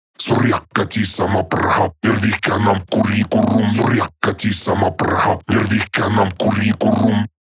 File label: 138 Shamanic Voice (Dry), Psytrance